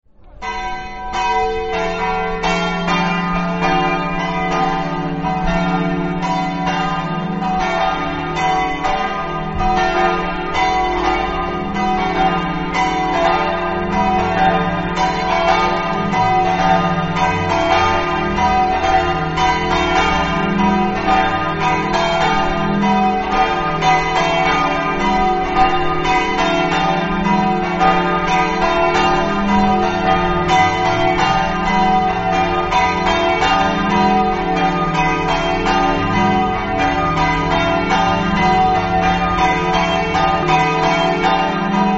campeglio, campane

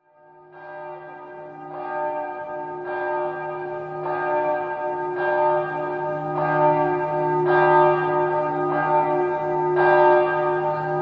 cividat, campane

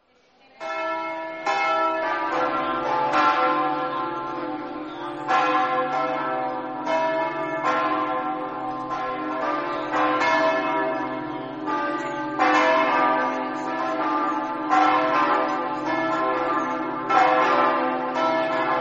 azzano, campane

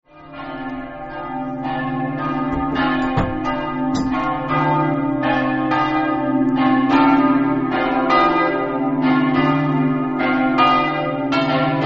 montenars, campane

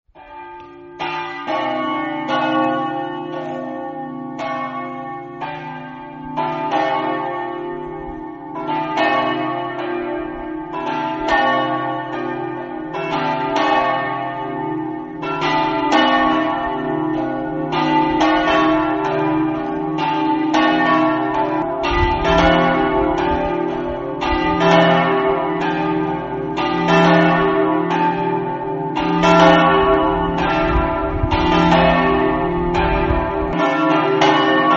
forgaria, campane

